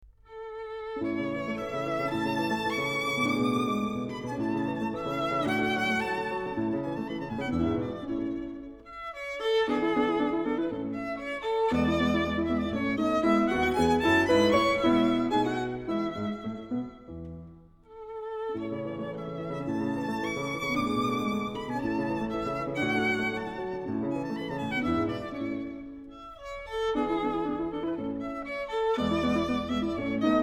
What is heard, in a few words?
Violin Sonata in A, Variazione IV; Mozart; K305 293d